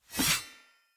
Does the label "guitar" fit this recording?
No